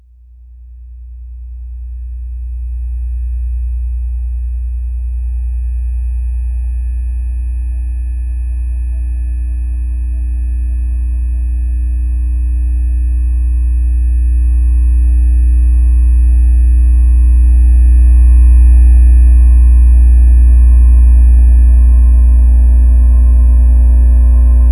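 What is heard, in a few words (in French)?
Cave pomp electromagnetique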